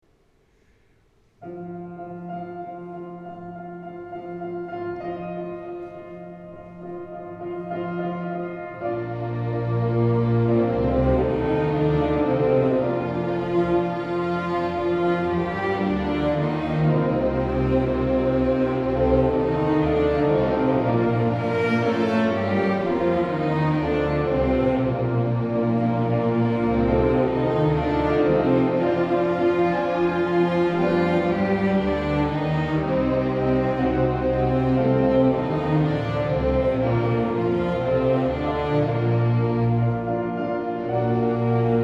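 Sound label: School Orchestra; Stover School; Tortoises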